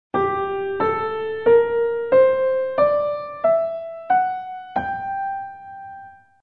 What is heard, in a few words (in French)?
Mineur melodique ascendant